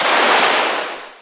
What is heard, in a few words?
fire